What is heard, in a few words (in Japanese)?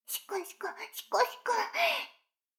シコシコ音声; 逆時間停止されて